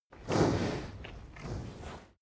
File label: cow breath